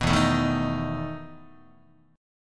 E Major 7th Suspended 4th E down Guitar

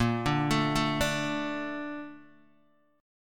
A sharp Major 7th, Guitar Open D

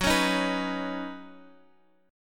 C sharp 7th Suspended 2nd Suspended 4th F sharp

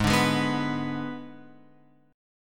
G sharp Diminished G sharp; G#dim Chord {4 5 6 4 X 7} strummed

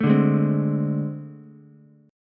A Minor Major 7th Double Flat 5th, down, Ukulele; strummed; JGuitar

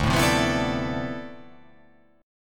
D Minor 13th, chord; Dm13 strummed; JGuitar

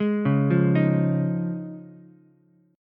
Db Major Add 9th, Ukulele, C6; arpeggiated; JGuitar

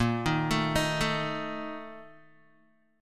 Bb Major 7th Suspended 4th, Guitar; arpeggiated

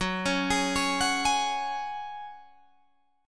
F sharp Suspended 2nd, Guitar Open G

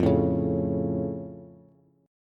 F Augmented, Bass; strummed; x,8,7,6; down

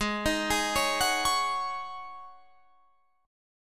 Ab 7th Suspended 4th Ab down Guitar Open F, Chord; Ab7sus4 arpeggiated

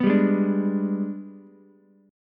Ab Minor Major 7th Flat 5th, down, Ukulele; AbmM7b5; strummed

